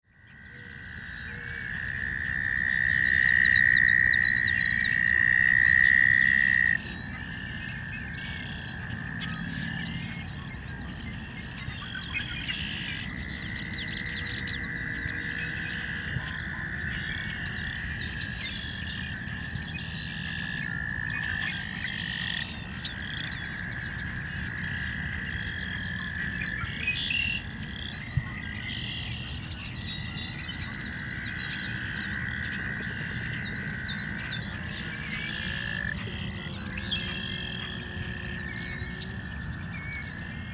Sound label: Maple park birds on wires